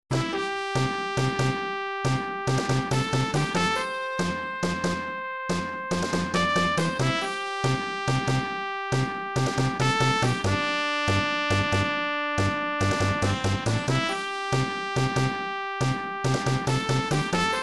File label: western tune; super nintendo; sequenced; cool spot